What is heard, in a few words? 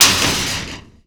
snap, percussive